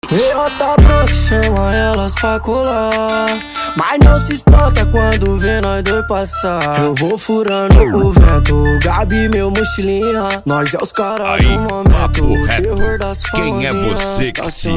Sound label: funk